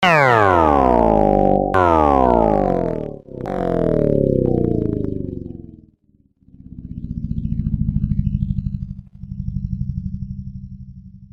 New 3d Sound

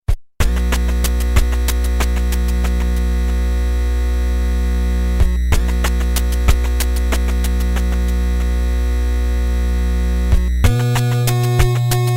8bit

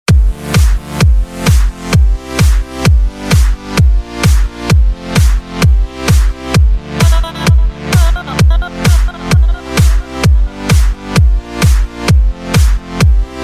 Dj Beat

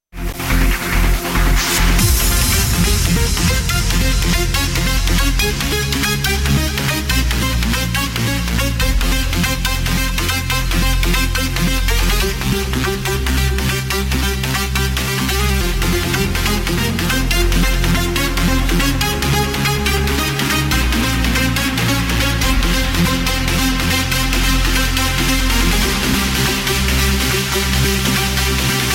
Dance Wit Trance

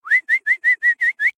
Whistle

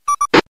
Communicator; Starfox

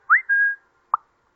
Whistle

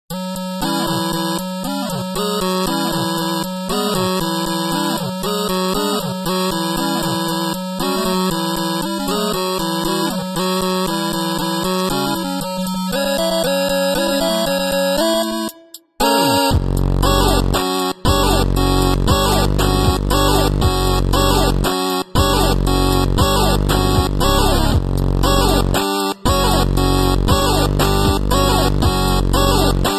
8 bit

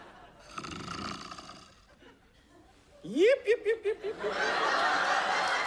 snore; Shawn and Cory

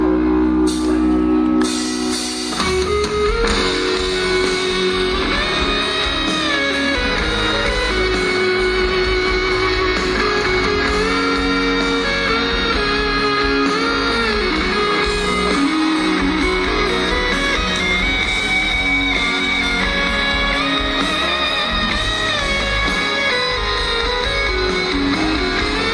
solo

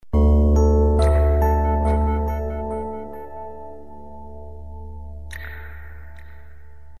Smoth Tone

